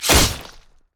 basic attack claw; rengar

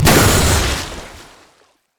hydroblast; nami base

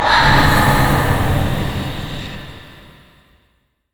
transfusion heal; LoL; vladimir

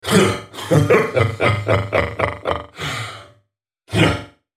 laugh; Jayce Sentinel

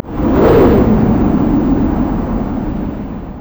yasuo base quickdraw wind; LoL

